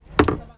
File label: Bucket Plastic Down On Hard Surface Thud On Off mic; India